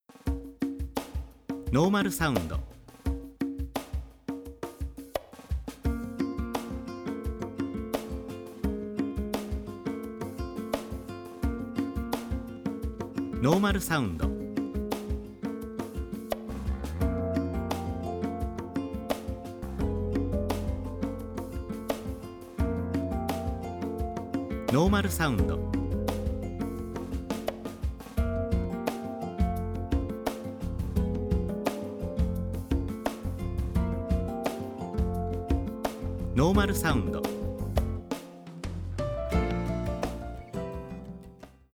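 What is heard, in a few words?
percussion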